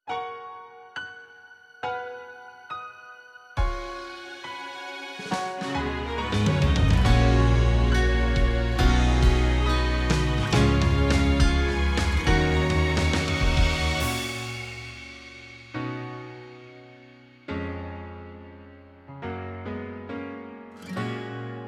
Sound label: inst